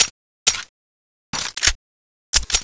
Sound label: luger reload; cstrike